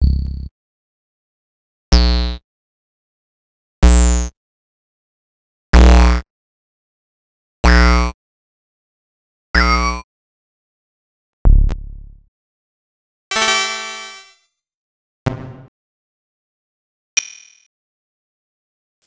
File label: Bass+FX; X-Static Goldmine 5